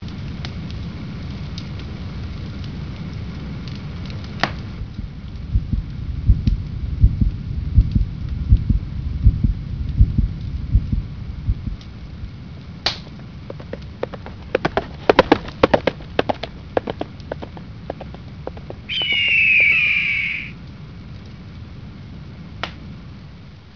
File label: RADIO